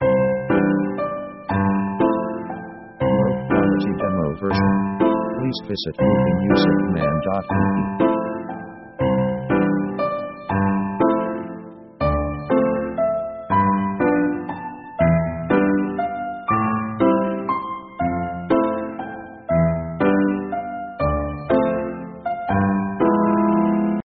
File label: piano walz walking; orchestra themes